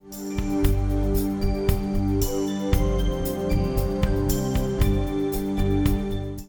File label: hat